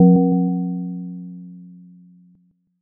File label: gong